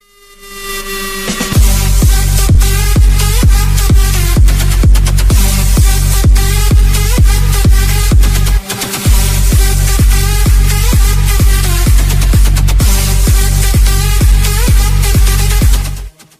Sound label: EDM